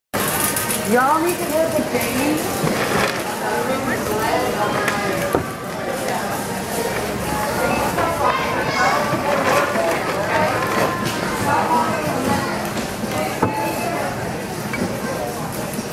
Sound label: Noise at grocery; COVID-19 pandemic